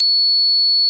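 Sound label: Overdrive Guitar C6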